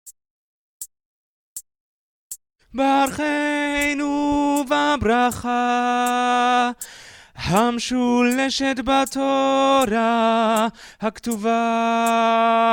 priestly blessing tenor, choir

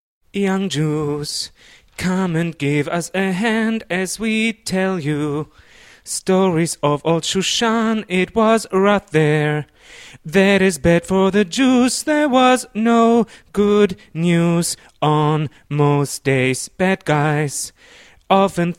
choir

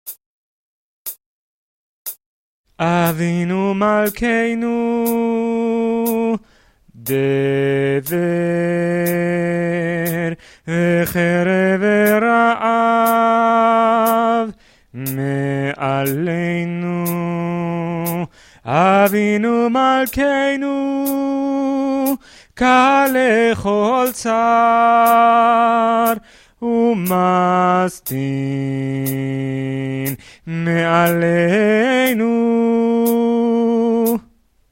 avinu malkenu bass, choir

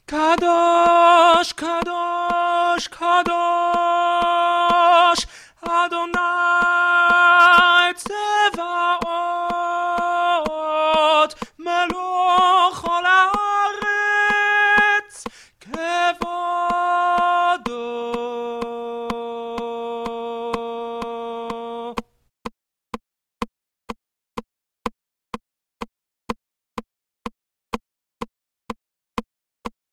kdusha, choir

